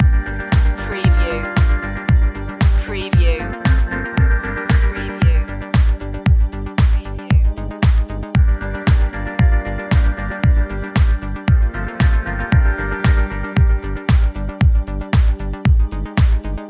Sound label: purple dream loop